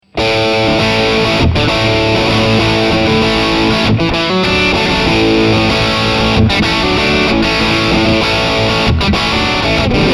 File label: amplitube crunch